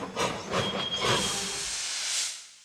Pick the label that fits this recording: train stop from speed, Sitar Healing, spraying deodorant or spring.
train stop from speed